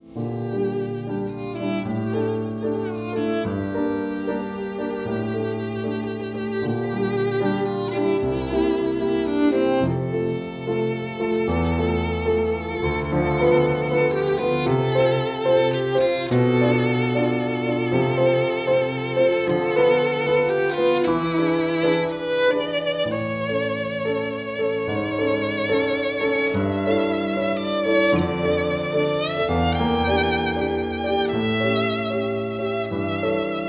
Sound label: VIOLIN AND PIANO; Extract from Suite Poetique by René de Boisdeffre; ed. Acte Préalable